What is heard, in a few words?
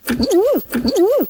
humhum; fall guys